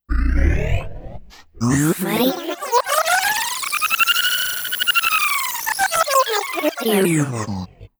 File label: Rinse Cycle; Sonic Foundry (Sony Creative Software); Pocket Diva World Class Vocal Samples